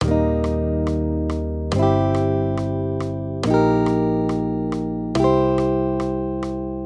maj m3, Major Triads, Root Movement Three Semitones, Guitar, Accompaniment; Eb-C